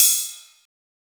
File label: Ride, Drum & Bass, Hats & Rides